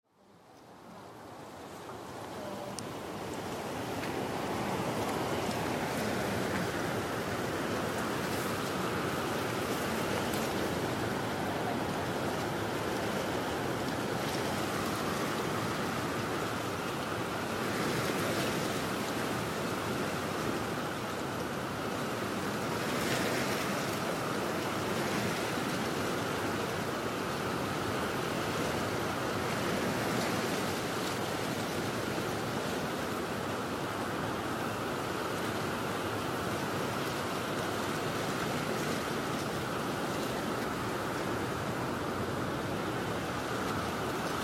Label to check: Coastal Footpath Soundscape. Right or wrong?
Right